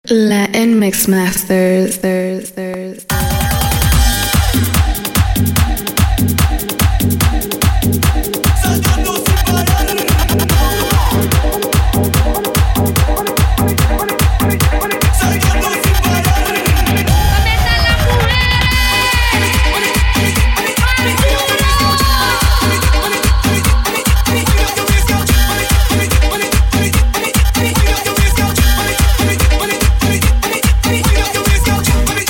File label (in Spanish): MERENGUE Mambo MIX